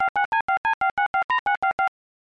Phone SFX; Cobra Mission: Panic in Cobra City; IBM PC AT

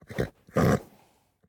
horse grunt

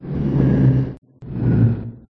Moan Breath; Bonnie; Five Nights at Ronald's

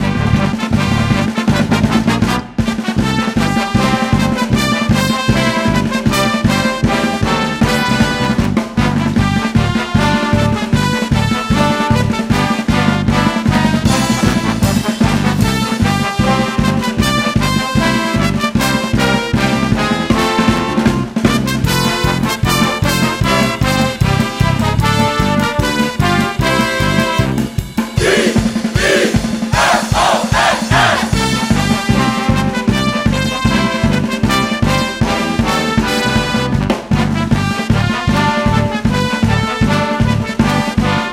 Broadcast Fight Song

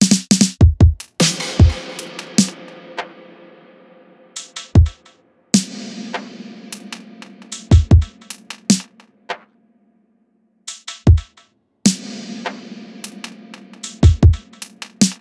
Drums; Dubble Bath

